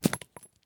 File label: grenade grass; STALKER; IWP